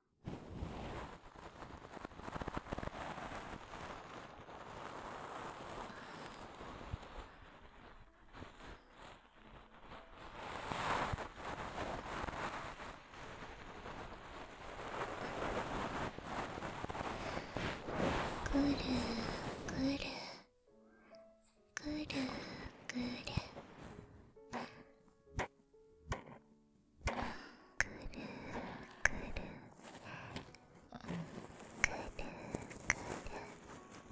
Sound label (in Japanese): 右耳, 耳かき; 博士がチョコレートを食べて酔払っちゃうお話; 確かに…これは親しい人じゃないと難しい距離感ですね